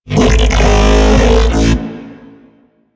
Mech Ambient Vocal

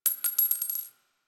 AK47 Shell Casing; Warframe; Tenno; Weapons

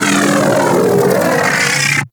Arch Gun Alt Charge Full, Weapons; Warframe; Archwing; Hildryn

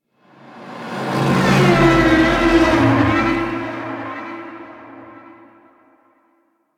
Vocal Patrol, Enemies; Warframe; Teralyst

